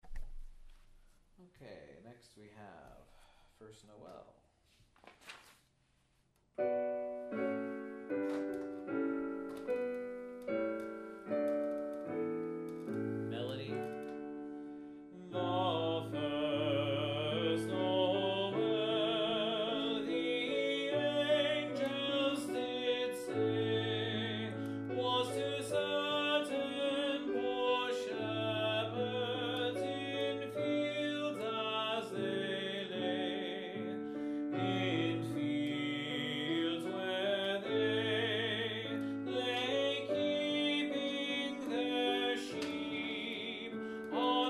Bass First Noel; St. Francis Music

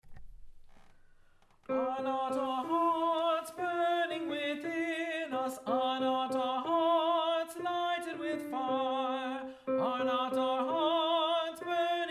Are Not Our Hearts Trebles; Are Not Our Hearts, Round

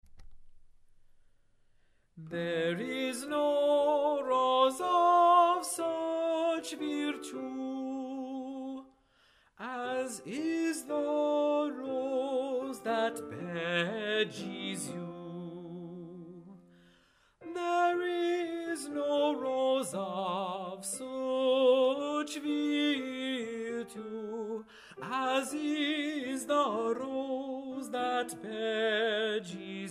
There is No Rose Tenor; There is No Rose, Anon.; Choral Resources

